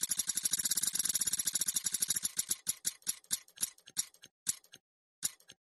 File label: box anim